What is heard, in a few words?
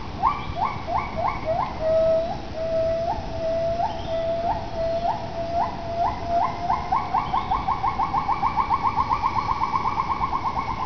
Hylobates mulleri; Hylobates mulleri female song